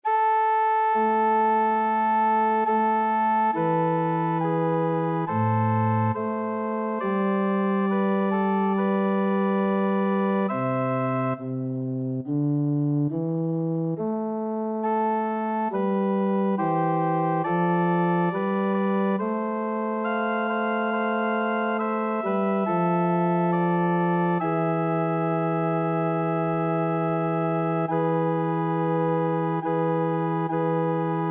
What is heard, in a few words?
Organ; Adoramus Te, Christe Ravanello